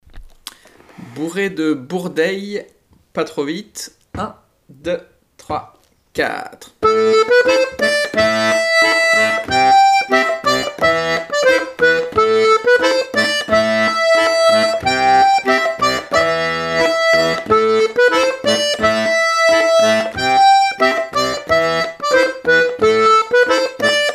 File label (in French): bourrée de bourdeilles